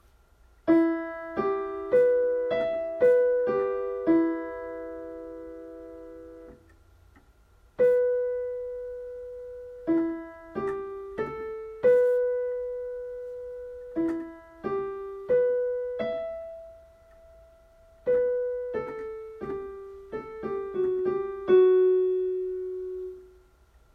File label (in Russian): Диктант ми минор